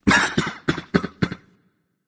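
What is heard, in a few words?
clear throat; smite; hou yi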